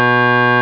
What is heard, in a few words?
just bass, pipes